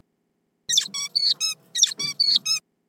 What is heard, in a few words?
bird recording